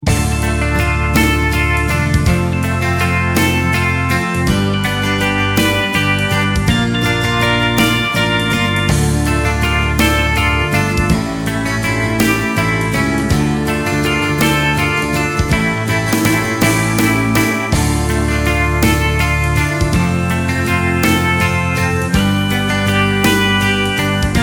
House of the rising sun To play along with, recorder